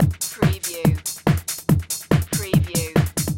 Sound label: Techno-Trance Drum Loop 142 BPM